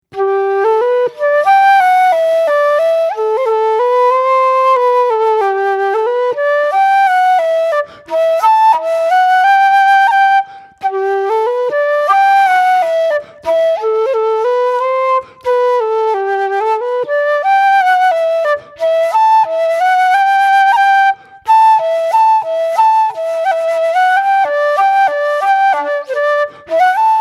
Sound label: Slower; Balleydesmond